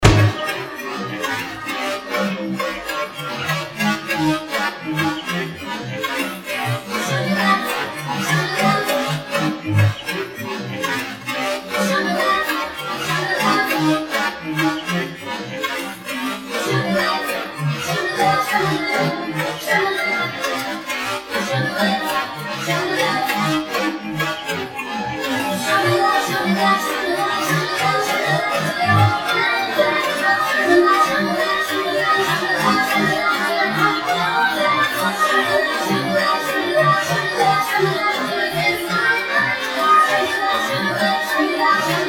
chorus

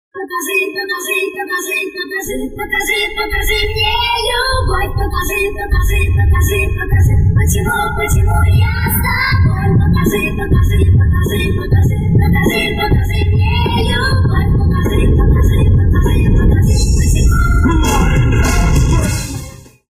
backing

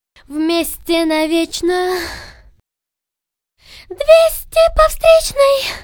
VOX Verse

